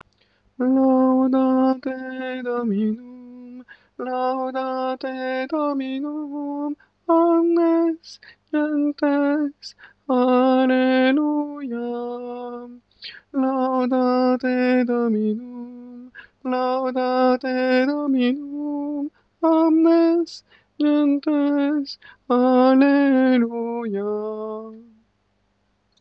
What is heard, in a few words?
Laudate Dominum tenor